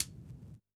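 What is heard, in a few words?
Vinyl Closed Hat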